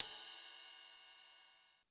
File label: Ride, Yamaha RY20